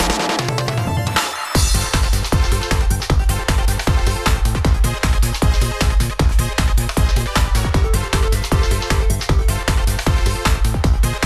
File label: BGM BATTLE FEVER; Girls RPG Cinderellife; 3DS